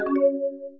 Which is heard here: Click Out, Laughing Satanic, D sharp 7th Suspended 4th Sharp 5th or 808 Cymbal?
Click Out